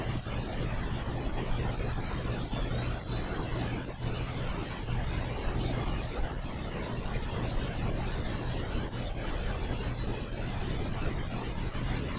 recording from sound card SDRSharp

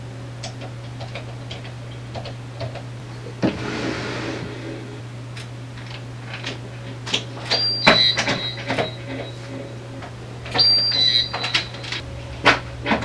ambient sounds recording

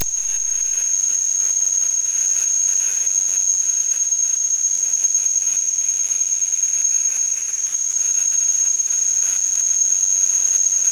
recording computer fan w ultrasound mic; funtenna

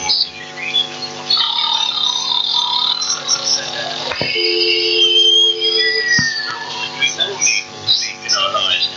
phone switch on; recording monitoring the monitor detail

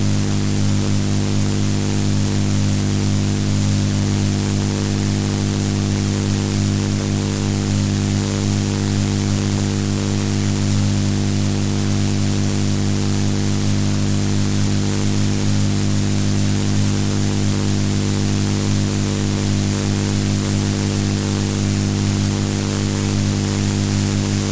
plasma ball speak thinking

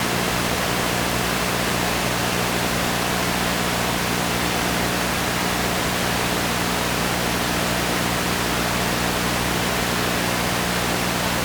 background noise

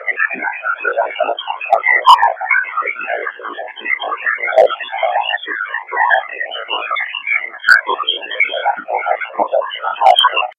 SDRSharp recording rf radio signal

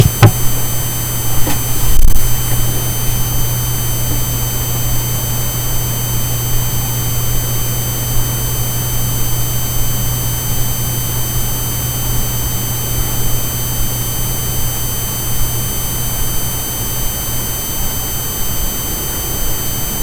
tone from battery charger

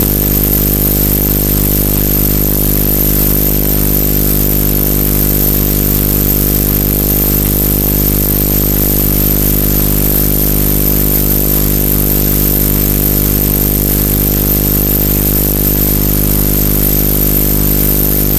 recording plasma globe bare end cable monitor

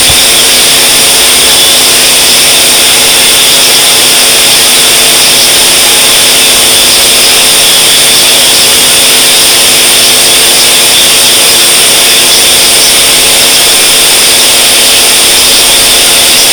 recording radiator piping chit chat

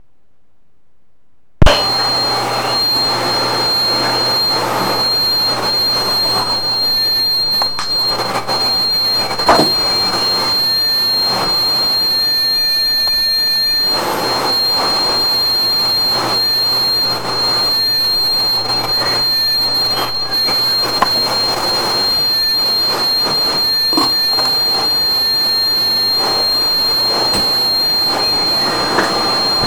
a tonal canopy over you

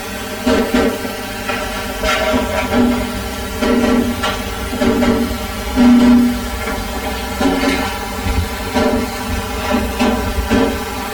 getting closer to transmitter by tapping

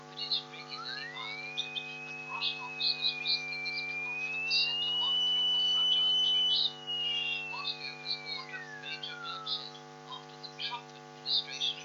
recording bus passing outside

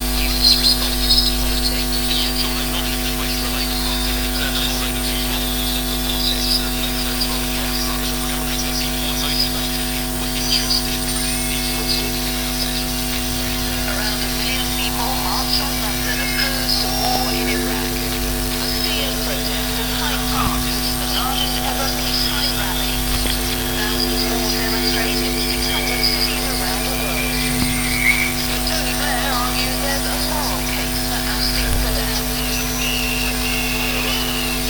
access point loop receiver radio signals; audacity

